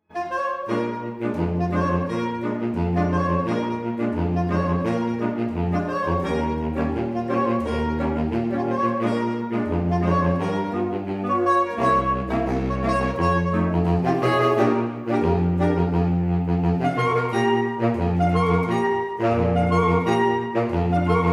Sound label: Saxo4 Soul Bossanova